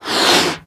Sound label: Balloon inflation; SFX; One shot; 7rystan's 600+ FREE EDM SAMPLES & DRUMS